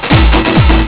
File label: tekkno eq